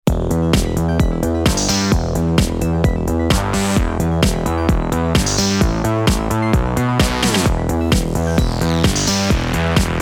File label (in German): russian filter loop sanft